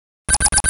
130 D#m Sfx, Bpm130, Complex Electro Synths; Prime Loops; Bias

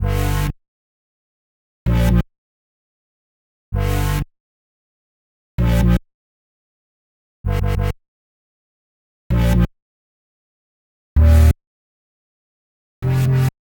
129 G Bass, Bpm129, Complex Electro Synths; Prime Loops; Androids

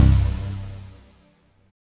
NOISY G1; Jack Sparrow Deep Dubstep Progressions

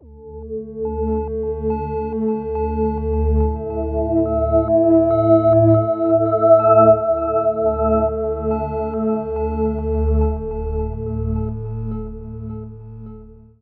Bpm141 Pad Loop D, Dubstep Chord Loops; Forest Dub

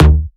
Bass Shot D#; Mutekki Media; Vengeance Electroshock Vol.2

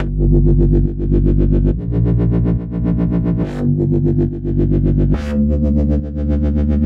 140 G BASSLINE, Dubstep; Loopmasters; The New Sound Of Dubstep; BASS LOOPS

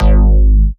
Bass Shot G#; Mutekki Media; Vengeance Electroshock Vol.2; Bass Shots

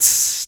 Human Beatbox, Beatbox Hits

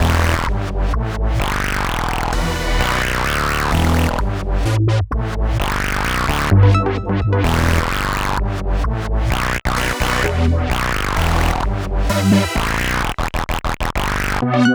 Full Mix, Em, Complex Electro Synths; Arena; Prime Loops

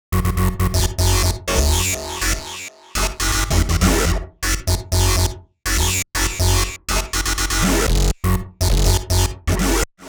122 F# Full Mix, Complex Electro Synths; Atomic; Prime Loops

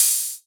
Ride, Cymbals

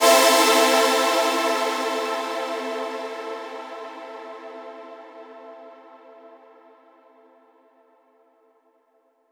FX Misc; Mutekki Media; Vengeance Electroshock Vol.2

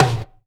Toms

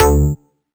Synth Shot D; Mutekki Media; Vengeance Electroshock Vol.2

